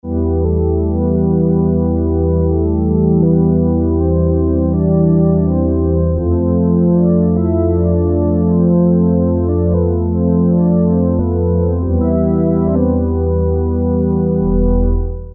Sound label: Dark Ensemble, Roland Juno-106; Factory Preset